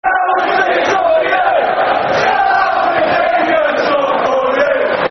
Shall We Sing A Song, Football Chants (Soccer Songs); Bolton Wanderers Bwfc